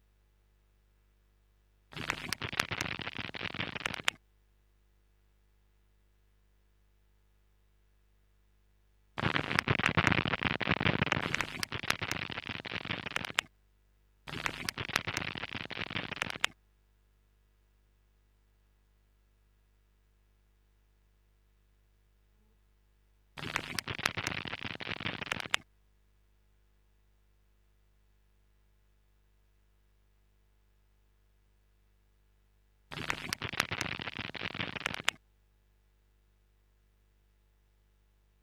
stereo instr knistern loop

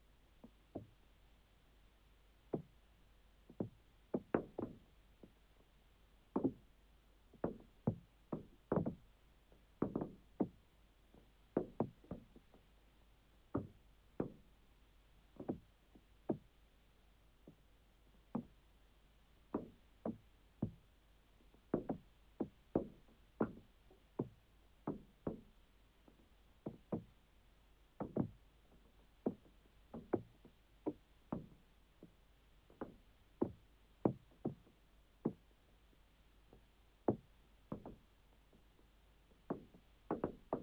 Regen auf Fensterbaenken; Tape Recording; Fieldrecordings

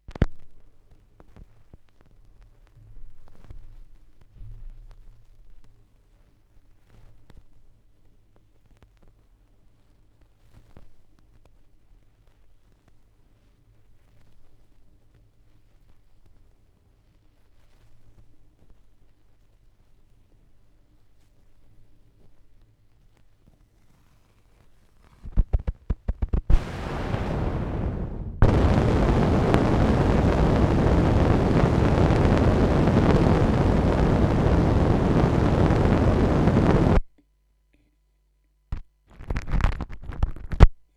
schallplattengeraeusche, Vinyl

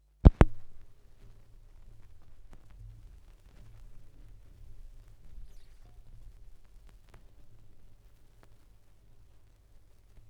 schallplattengeraeusche, Vinyl

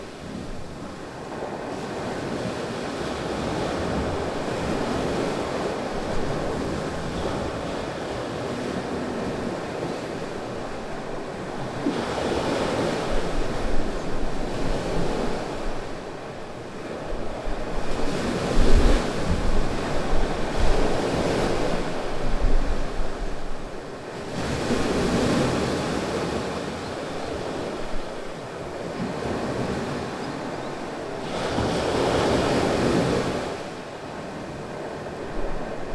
Wellen, in der Kiste, aufm Balkon, andersrum (Rode NT4); Curaçao; Fieldrecordings

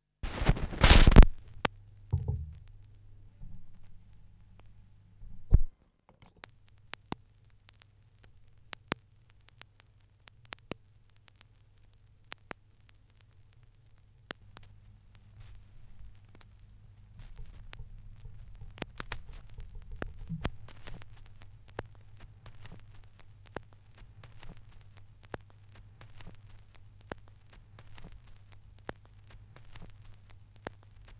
schallplattengeraeusche, Mix

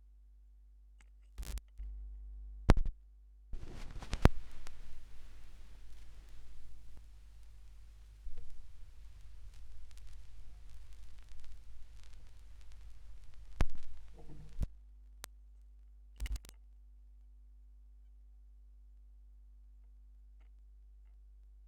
schallplattengeraeusche; rauschpartikel

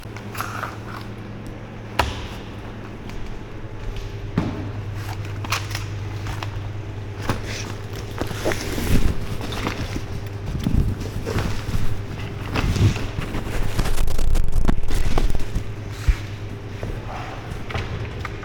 In Der Halle; Fieldrecordings; Cafe in Lissabon

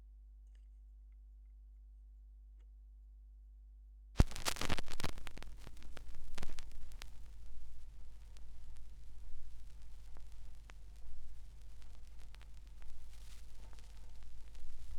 schallplattengeraeusche; rauschpartikel

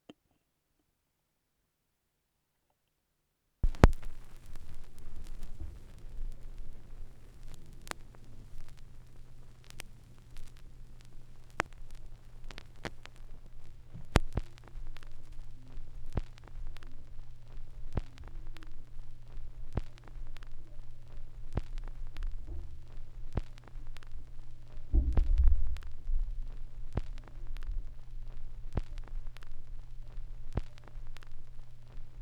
schallplattengeraeusche

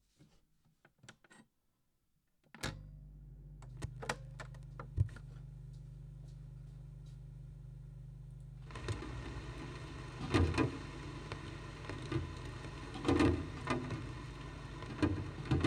Echo Mode 2 (Lautsprecher Tonband), Trommeln im Regen Tape Echo Mix

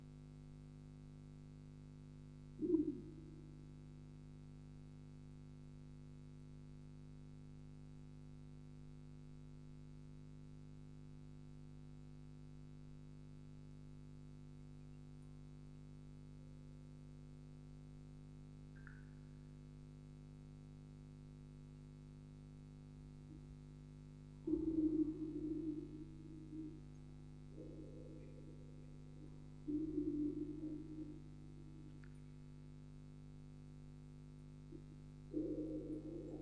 Aufnahme Vocoder, Spieluhren-Quartett

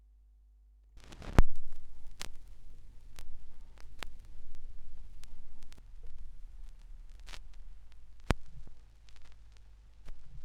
schallplattengeraeusche; rauschpartikel

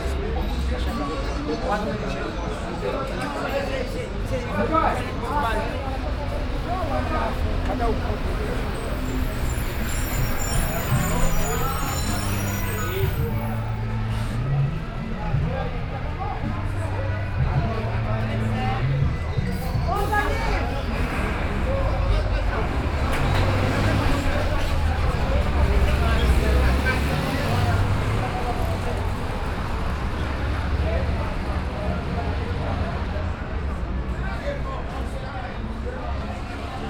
im Cafe unterm Minhocao, Fieldrecordings, Brasilien; St.Cecilia

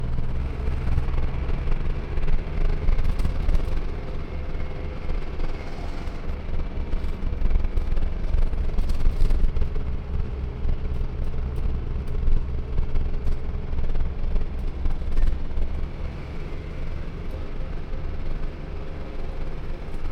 Im Bus

